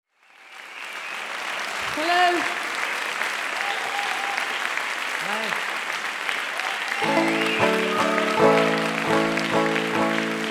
applause; sendesaal radio; bremen, germany

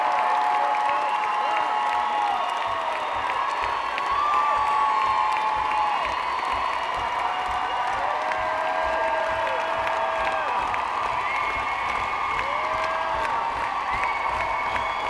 crowd noise

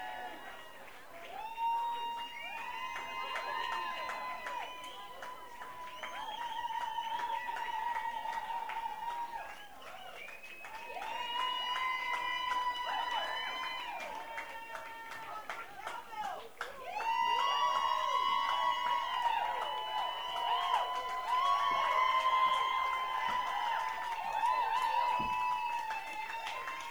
applause; north star bar; philadelphia, pennsylvania

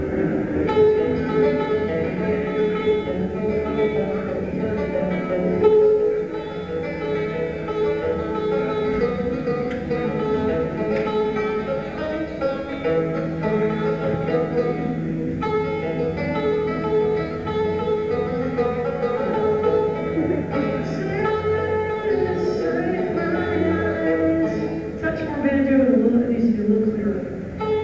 soundcheck

